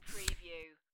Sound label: Playing Cards Slide One Card Off Top Of Deck